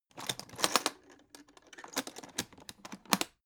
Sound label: alu case small open close